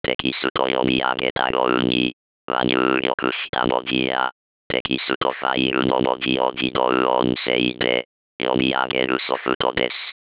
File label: machine